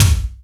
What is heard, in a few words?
BD, Yamaha RY-10, Drums